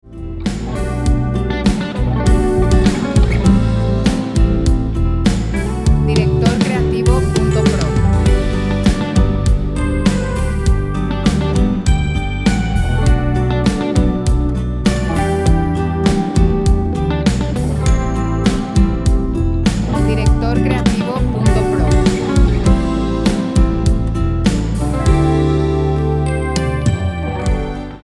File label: 100bpm 4/4 G